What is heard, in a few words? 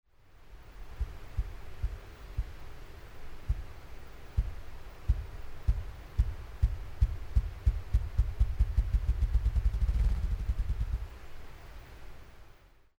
Ruffed Grouse